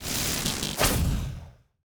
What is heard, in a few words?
electric golem strike